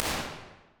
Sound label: 0.8s Wardrobe, Impulse Responses; Small Spaces; Indoor Spaces; Apple